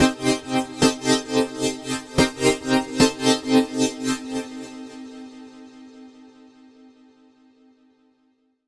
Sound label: Tremor Arp; Dr. Fink's Funk Factory